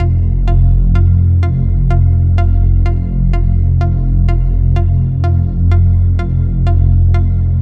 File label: Synth Loop Fm 126; Leads